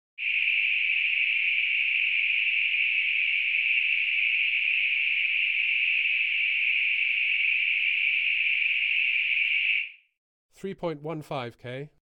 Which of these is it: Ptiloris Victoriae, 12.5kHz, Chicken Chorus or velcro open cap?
12.5kHz